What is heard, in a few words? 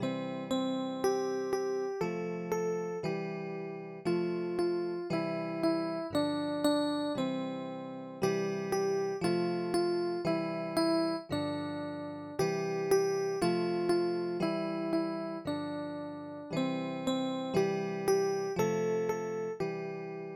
keyboard